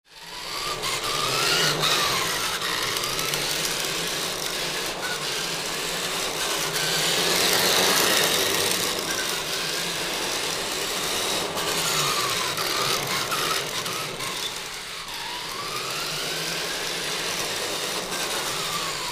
Rc Car Radio Controll

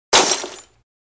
Bullet Impact Glass D

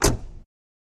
Buick Park Ave 87 Door; buick park avenue 1987

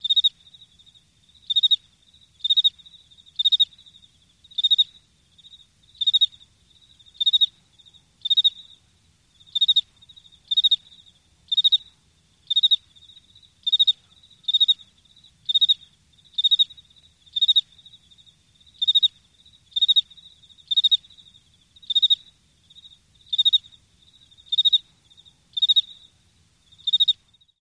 Crickets Solo Cicada

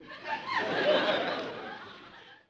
Crowd Lt Burst, small int

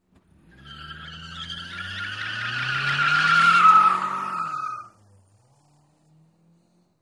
Sedan Accel Tire Sql

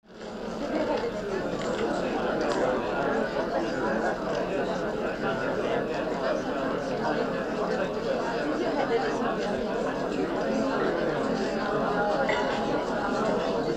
Ext Large Crowd Boos; upset

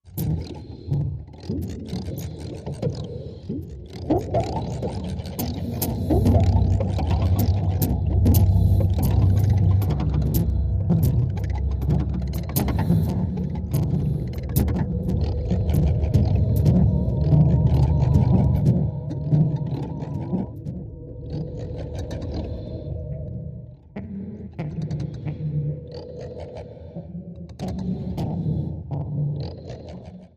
Machine, Creaking, Animal Like, Twisted; Barnyard Randomizer

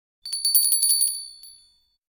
Brass Door Bells Jing-a-ling, High-pitc; Brass Door Bells Jing-a-ling, High-pitched Close Perspective.

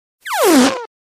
Fart Foley Strain; farts

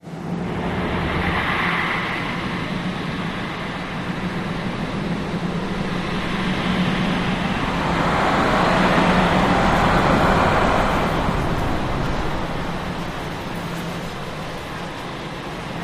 Seashore liquid effects with heavy sweeping above

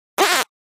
Fart Foley; farts

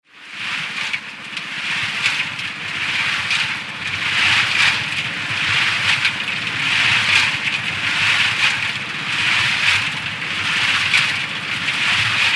Cement Mixer Idling

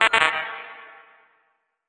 Button Press Deny

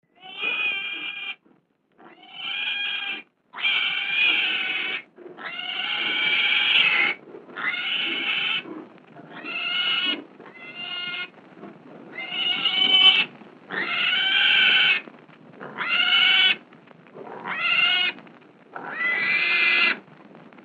Piglet Squealing

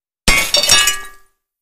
Glass Impacts Debris; windows & mirrors

